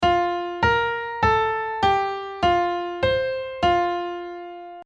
Playing in F; piano quiz

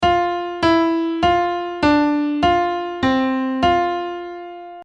Playing in F; piano quiz

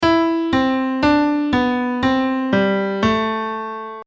Minor Scale Piano

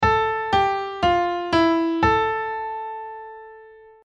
Minor Going Down; minor key going down piano quiz

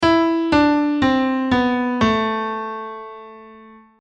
Minor Key; Quiz

